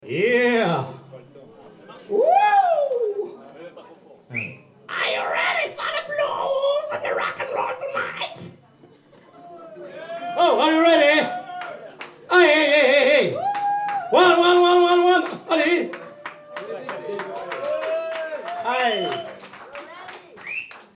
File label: cheer; congo; magic